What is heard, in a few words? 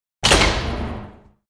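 door metal close; The Elder Scrolls III - Morrowind; Morrowind GOTY GOG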